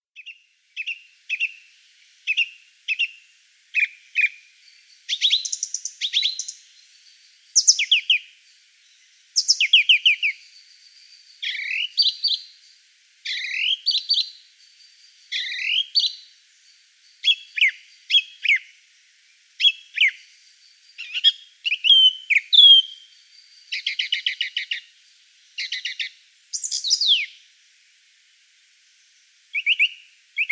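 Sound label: pevchiy drozd golos, song thrush voice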